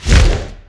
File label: wings; hl1 npc; stukabat